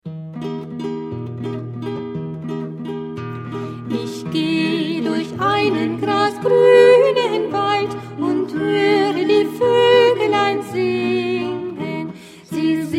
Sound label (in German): Volkslied; Waldvoegelein; Auf den Fluegeln des Gesangs